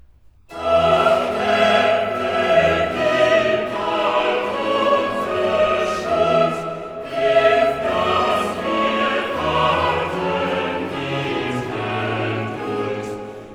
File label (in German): Choral, cantatas; Bach; BWV 127; Herr Jesu Christ, wahr' Mensch und Gott; Ach Herr vergib all unsre Schuld